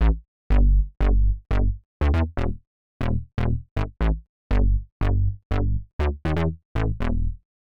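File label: Synth Bass E4, Nu Jazz House, Bass Loops, 120 bpm; PowerFX - Nu Jazz House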